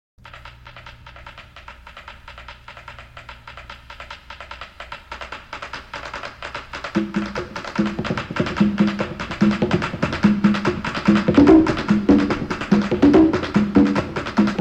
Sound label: bongo instrumental